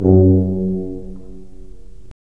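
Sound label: brass tuba; LA Composer vol. 3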